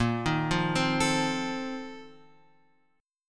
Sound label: A sharp Major 7th Suspended 2nd Suspended 4th A sharp; A#M7sus2sus4 Chord {6 6 3 5 X 5} arpeggiated